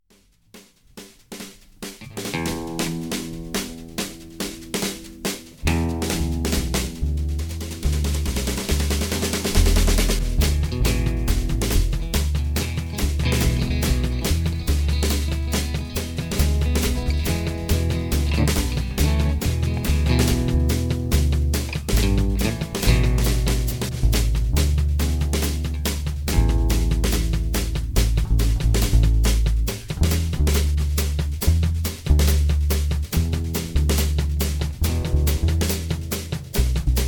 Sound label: Bass Guitar Loop